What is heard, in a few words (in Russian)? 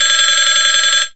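громкий звонок на старый телефон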